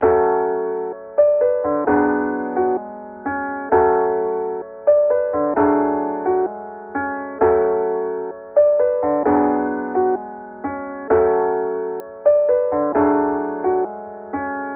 Melody Loop - 130 BPM F# Min, Hip Hop; Cymatics - 9God Hip Hop Sample Pack

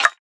Percussion; Cymatics; Eternity Sample Pack; Drum One Shots

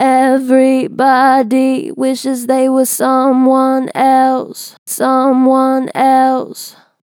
Strong Vibrato, Natural Vibrato Strong, Vocals; Harmor Vocals

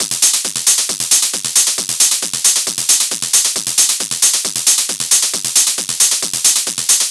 Hat Loop 135, Techno; Loops; Drums; HiHats; Ghosthack; AC2024 - Day 1 - Techno Sounds 2025

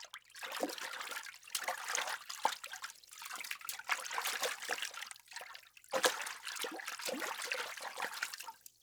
Water Light Splashing; Fairlight Sound Lib

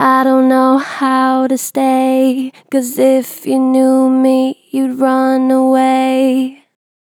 Natural Vibrato Gentle, Harmor Vocals; I dont know how to stay

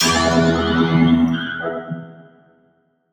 Riddim Melodic Hit - E Sludge; One-Shots; Ghosthack; Riddim Essentials Volume 2

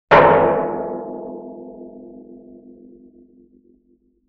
Oil Drum Hit Deep Sustain; Vintage Cartoon; Drums & Percussion

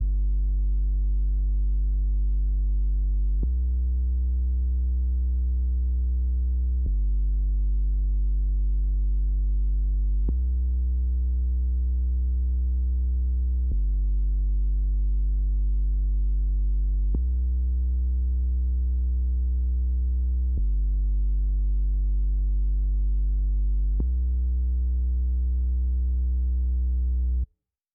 70 BPM B Min Sub Bass; Melody Loops; Bluebird; Cymatics; ANALOG EVOLUTION Beta; Lofi Collection

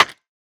Rimshot, lofi

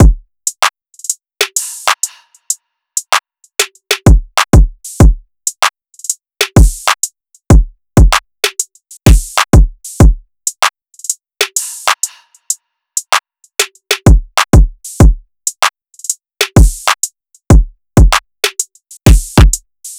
Drum Loop 96 BPM; Good Vibes; Cymatics; King Drum Loops

